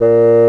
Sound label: WINDS & BRASS